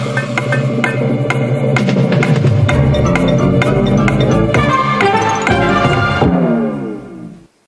countdown, music minigame; tf2ware ultimate

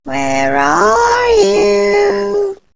granny alert; zerosiki; slender

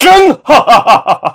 hahaha

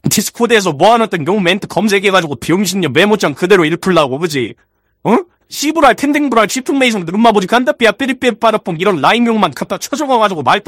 negative vocalization; scout

